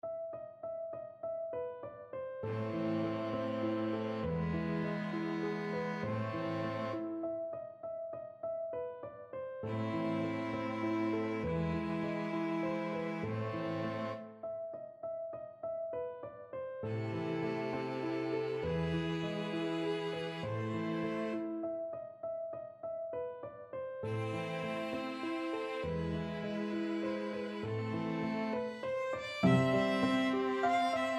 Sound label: beethoven fur elise piano quartet